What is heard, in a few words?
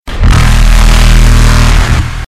reaper sound loud